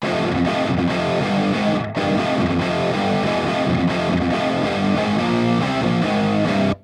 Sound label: chords